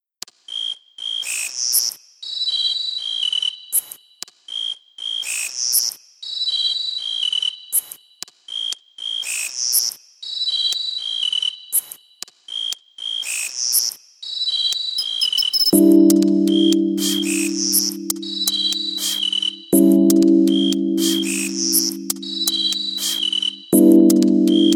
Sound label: crickets clicks